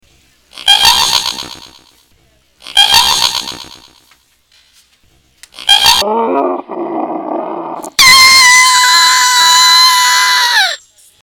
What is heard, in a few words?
ahhhhhhhhhhhhhh